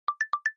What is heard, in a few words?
samsung notification sound; telepathy